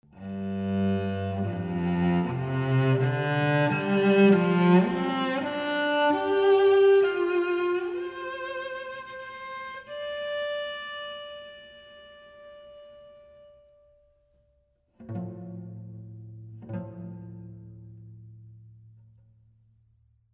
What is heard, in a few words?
Cello, Scoring Stage; Bricasti